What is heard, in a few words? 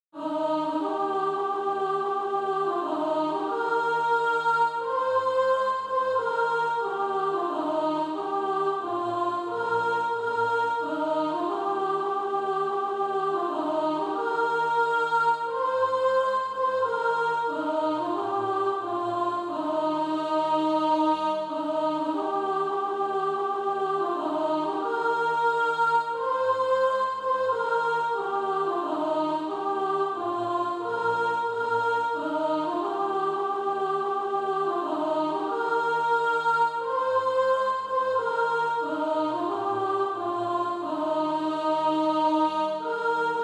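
soprano, Practice Music; A Cry from Macedonia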